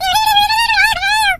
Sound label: Squeak start